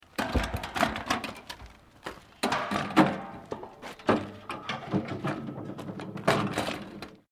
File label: Trolley Put On Truck, trolley jack; Domestic trolley jack; Machinery (Sound Effects:Machines)